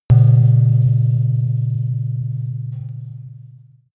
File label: impact metal deep long; maschinen; soundpool